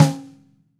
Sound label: Radio King Snare FFF Room; Sonic Foundry (Sony Creative Software); Vital Drums